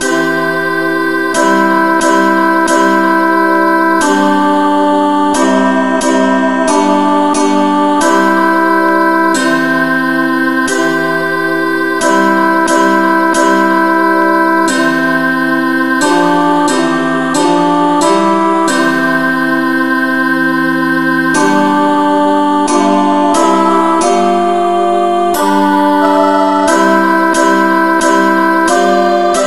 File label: rev no vocal key c